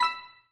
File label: stud collect; Gotham City Speed